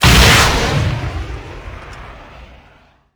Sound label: polycraft launch